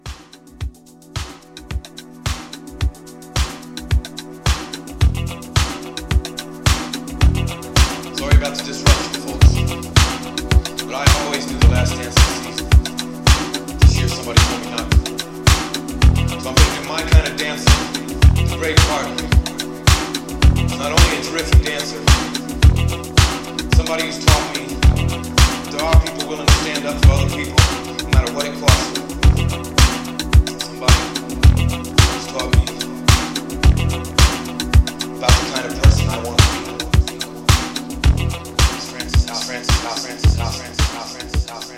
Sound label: 80s Re Drum 109